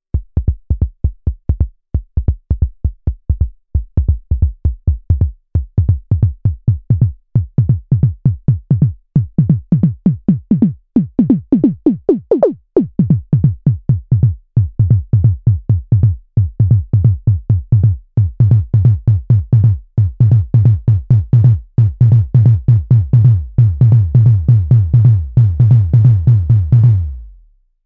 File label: bassdrum